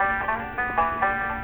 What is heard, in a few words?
banjo